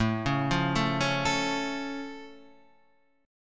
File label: A Augmented A, Guitar Standard; arpeggiated; JGuitar; 5,4,3,2,2,5